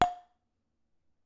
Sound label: bellplate corner; bellplates